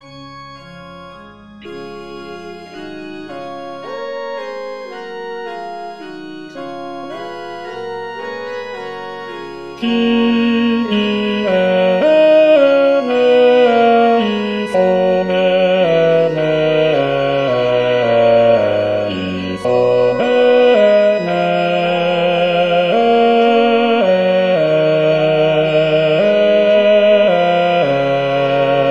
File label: kyrie bass